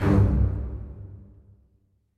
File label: low dramatic short; soundscrate